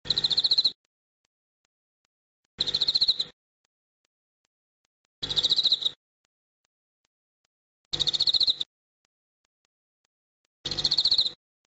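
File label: autumn insects; akinomushi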